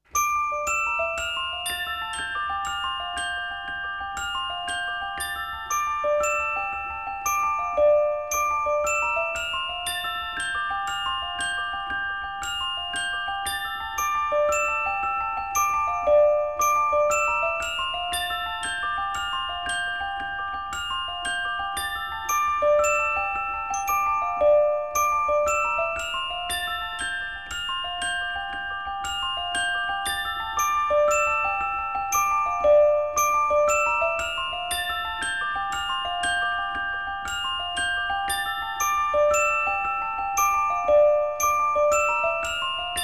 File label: Celesta And Bells